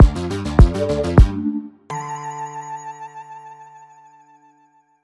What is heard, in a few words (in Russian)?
сбивка; audiobrending dlya podcasta